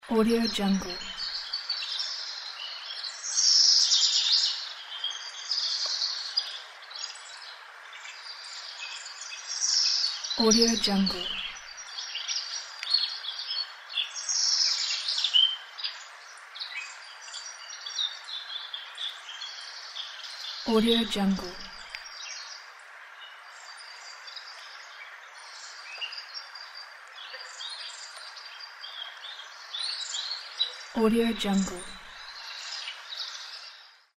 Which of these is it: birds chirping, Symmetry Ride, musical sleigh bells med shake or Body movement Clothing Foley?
birds chirping